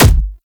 Explosion Punch Generic Medium; Explosion Punch Layers; Weapons

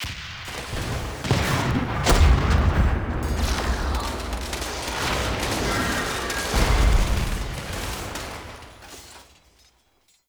Ambient Battle Close; Warframe; Tau

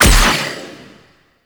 Shot Fire; Warframe; Noctua; Weapons

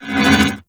Void Portal Rotate One Off

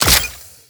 Crafting Equip; Cetus; Hunter

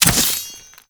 Machete Finisher Pull; Sentient; Warframe; The New War; Kahl; Weapons

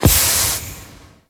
Organ Air Blast; Ambience; Gameplay; Warframe; Orokin Moon

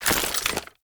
Bayonet Reload; Warframe; Tenno

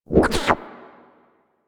Mine Osprey Idle, Dialog

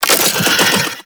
Wall Eye Detatch C; Warframe; Sentient Devourer; Ambience

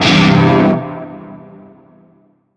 Shawzin Pent Maj Chord F; Warframe; Narmer

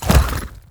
Horse Jump Heavy; Vehicles; Foley Actions; Warframe; Duviri

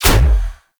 Dash Impact; Warframe; Focus; Operators; Operator Wraith Movement

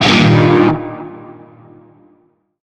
Shawzin Blues Chord; Warframe; Narmer Shawzin

